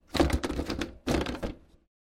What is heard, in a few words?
Toilet Paper Roll